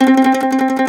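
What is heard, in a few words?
MANDOLIN TREMOLO, Plucked Strings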